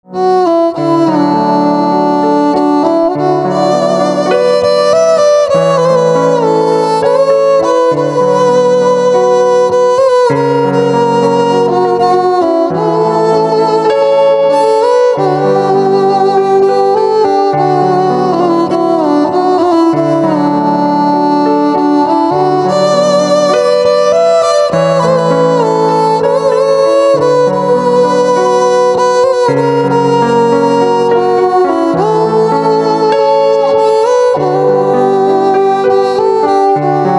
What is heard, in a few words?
Violin